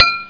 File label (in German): KRISTALL